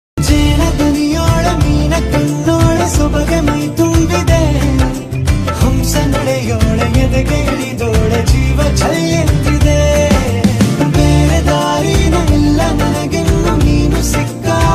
song bgm ringtone love bgm ringtone south bgm ringtone